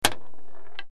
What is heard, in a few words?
thunk; labyrinth